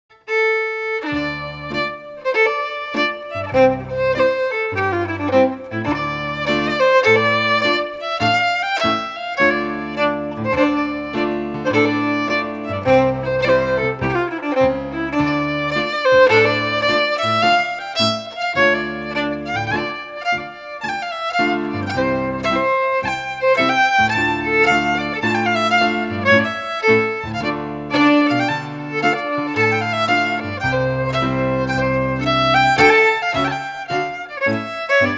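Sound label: Strathspey, Scottish Fiddling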